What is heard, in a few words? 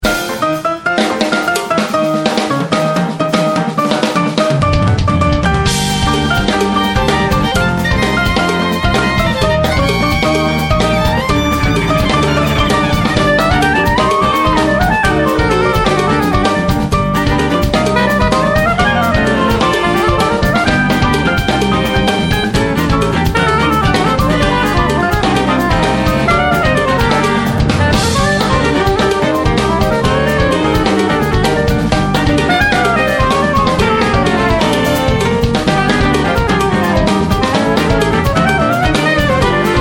Sax Quartet Latin Show